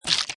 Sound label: Cartoon Squish, Wet or soggy object splash; Comedy/Cartoon; Funny Sounds; Lynne Music